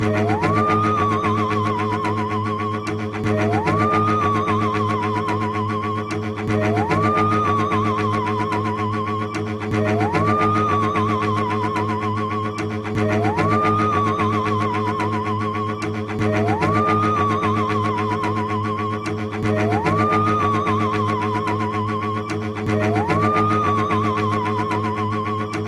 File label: Theremin